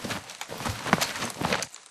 crawl grunt; Titanfall 2

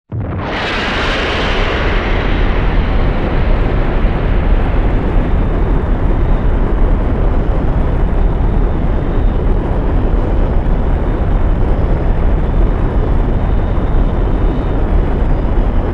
large ship takeoff; Titanfall 2

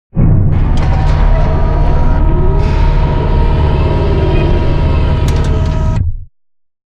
Giant Floor Panel Assemble Final Lift Servo; Boomtown; Sideways Town; Titanfall 2

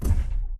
footstep jog; Titanfall 2

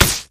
imp bullet energy titan; Titanfall 2

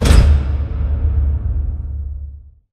sliding door lg verb close stop; Titanfall 2

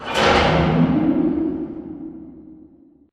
Robot Arm Track Start Dist; Boomtown; Titanfall 2

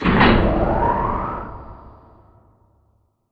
Robot Arm Track Start Mid; Boomtown; Titanfall 2

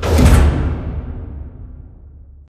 sliding door lg verb close start; Titanfall 2

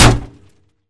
Imp Bullet Light Ballistic Solid Metal; Titanfall 2

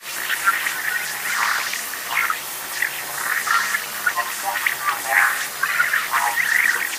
Radar Jammer Signal Static; Boost Card; Titanfall 2

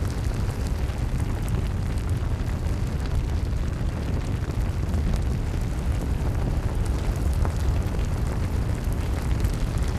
fire loop medium flame; colony; Titanfall 2

